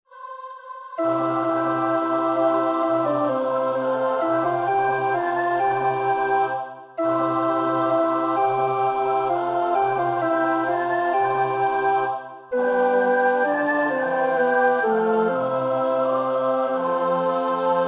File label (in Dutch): In dulci jubilo vers 3 (tenor 1); Praetorius (Michael)